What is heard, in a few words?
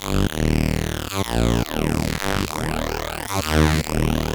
Synth Melody; Eclectic Electronica